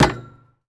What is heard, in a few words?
Street Ball Glass Rim; SFX Kit